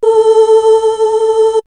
Choir; X-Static Goldmine; backtothe70's

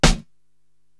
SD Dry Smack, Korg TR-Rack drum machine

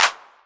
Clap, Novation Drumstation

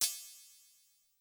Cym; Sonic-Potions LXR; Goa

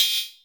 Metal

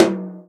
tom, Real Drum Kit, jungle kit

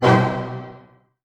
F# MAJ, Orchestra, ORCH HITS; Sound On Sound; Peter Siedleczek's Orchestra

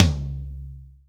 DRY TOM, STUDIO TOM

